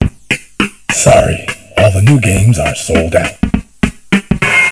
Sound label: Exiting Cool Mode